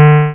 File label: Failed open attempt; Curse of Dragor